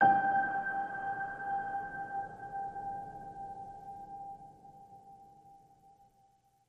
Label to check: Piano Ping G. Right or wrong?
Right